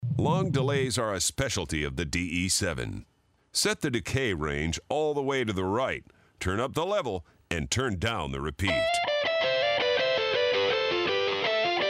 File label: Long Delay